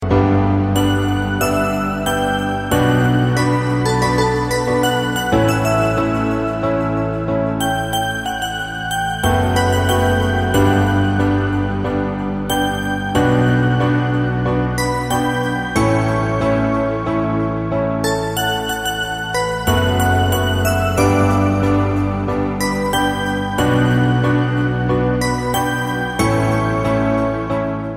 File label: Instrumental